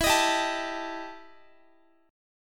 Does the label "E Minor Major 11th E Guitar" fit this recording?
Yes